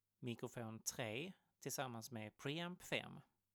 mic pre

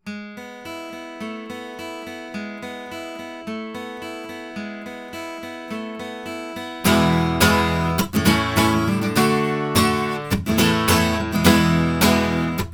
BLUE Babybottle guitar Gibson

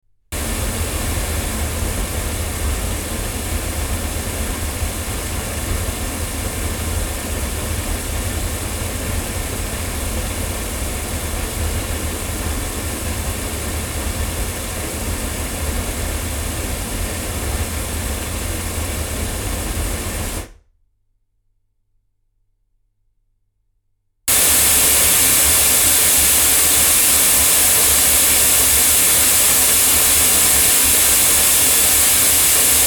Generis GT2 Mk2 omni loudspeakers

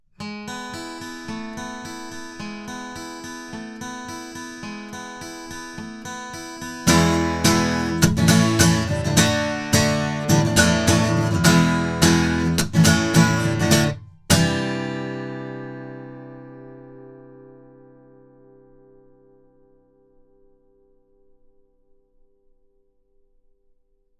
Avant CV-28 omni guitar

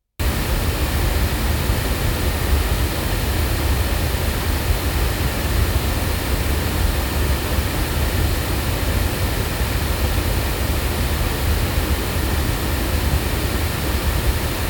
Oktava ML53 loudspeakers

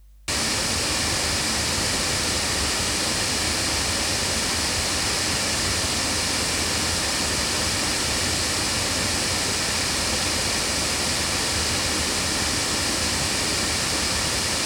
Yoga DM-564 loudspeakers

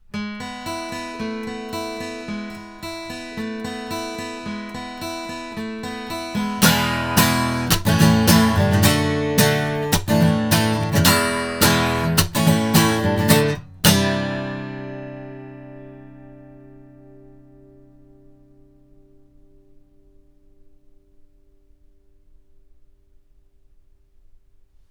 guitar, microphones; Clarion 8; Lauten

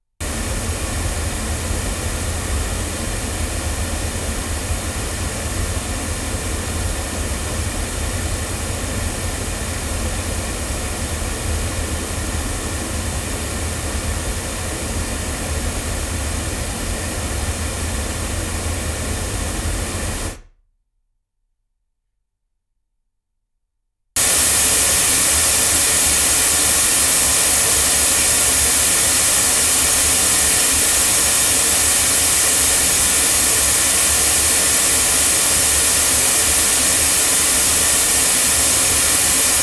Rode NT2 omni loudspeakers

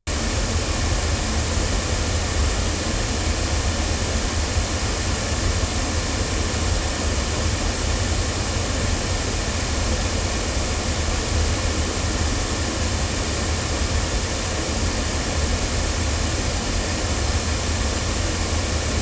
loudspeakers, microphones recordings

